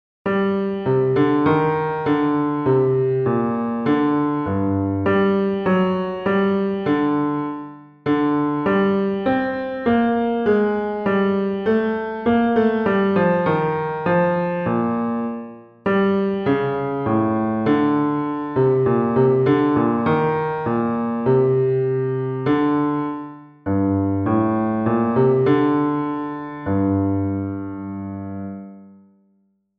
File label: Bass